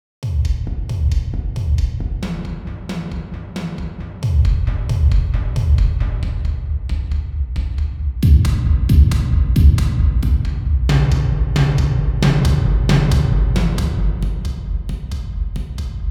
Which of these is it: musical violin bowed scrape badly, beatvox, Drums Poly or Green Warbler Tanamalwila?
Drums Poly